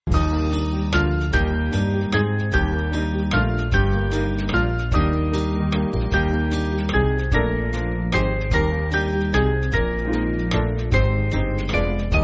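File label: Band, Music Backing; Of The Fathers; Corde Natus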